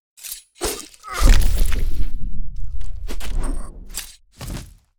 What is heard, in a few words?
slowmo; yurei